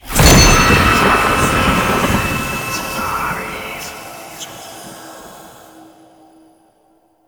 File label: Mirror Defense Rune Cluster Spawn; Warframe; Entrati